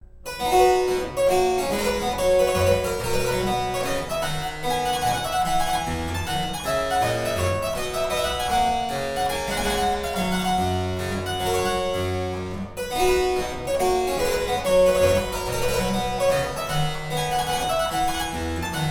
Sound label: Harpsichord Suite No5 in C major Corant; Z666 III